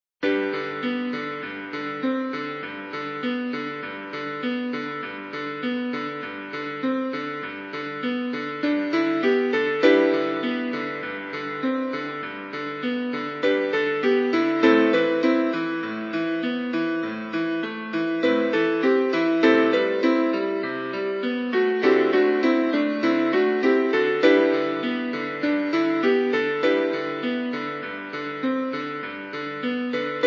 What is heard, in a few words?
Piano